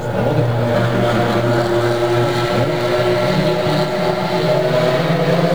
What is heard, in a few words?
72 porsche limping